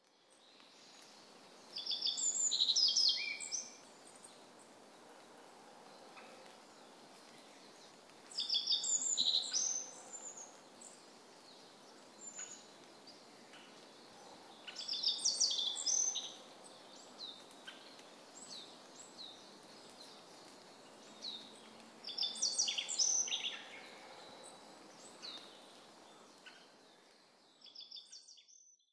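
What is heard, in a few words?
Yellow Bunting; yamanaka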